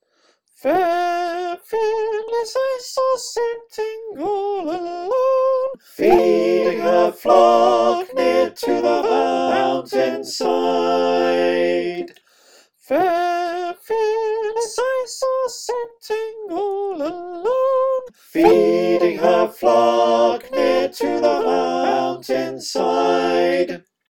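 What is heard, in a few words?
soprano alto tenor bass, choir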